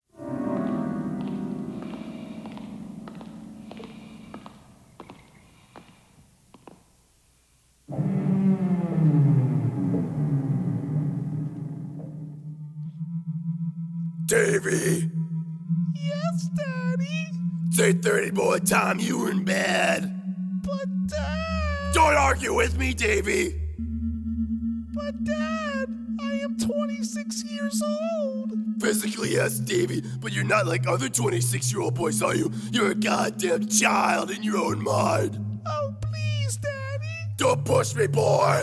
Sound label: Final Scream